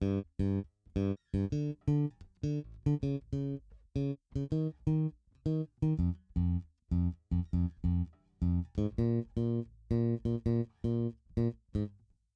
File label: Bass Loop